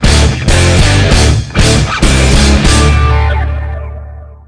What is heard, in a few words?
Jingle Gratification